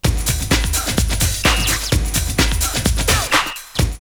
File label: Fat Beat 128, drumloops, Rave; X-Static Goldmine; 90 s Sample CDs